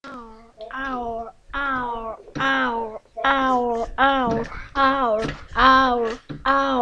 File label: owl